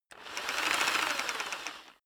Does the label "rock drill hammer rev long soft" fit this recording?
Yes